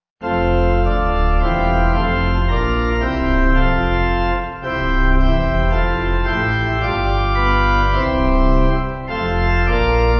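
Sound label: Pipe Organ, Music Backing Files for Churches; Lasst Uns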